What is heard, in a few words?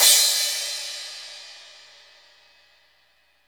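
crash cymbal, Roland; Club-50 - Foundations Roland; Hybrid Kits; Thin 5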